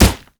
punch grit wet impact

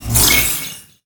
Result Level Up

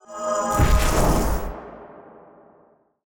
Crucible Burst

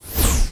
WHOOSH Air Very Slow mono

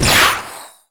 Magic Spell Reflect

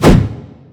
Punch Swing

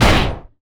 IMPACT Generic; mono

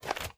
STEPS Dirt, Walk

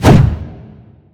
Punch Swing

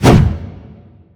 Punch Swing

